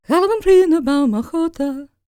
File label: GAELIC, World Voices, GAELIC FEM